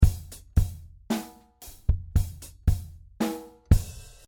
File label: clean